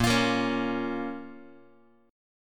Bb Minor, Guitar; strummed; JGuitar

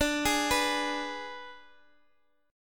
Ab Diminished D

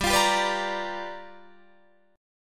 G 7th Sharp 9th G chord, Guitar Open D

